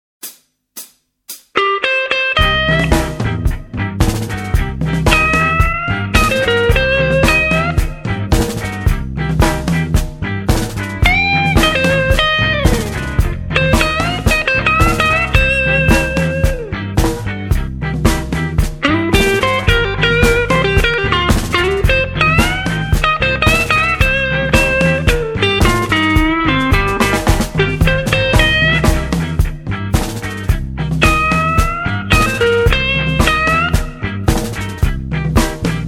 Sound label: blues jazz